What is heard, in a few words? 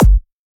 Dr Kick